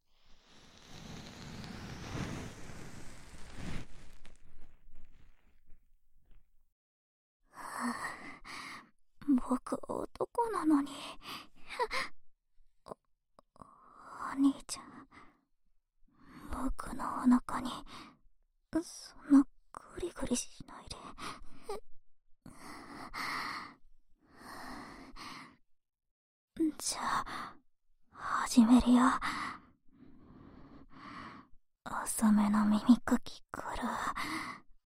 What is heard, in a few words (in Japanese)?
左 浅め耳かき, ASMR; 耳かき小分けトラック